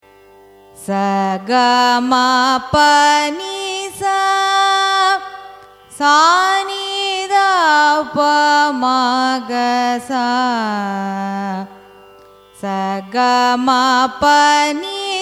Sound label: raga kamala manohari arohanam avarohanam; carnatic music